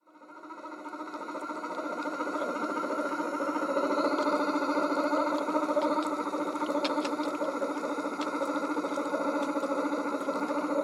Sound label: bear